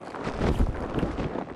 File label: flyer fly med dist; Titanfall 2